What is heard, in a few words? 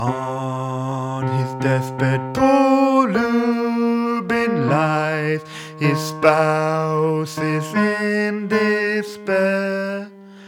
Poor Lubin t b; classical-traditional